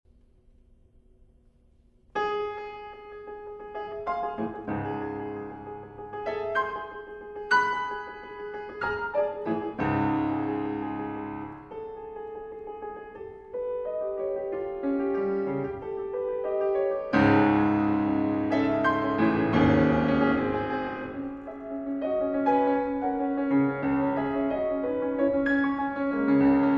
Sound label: Piano; Composition samples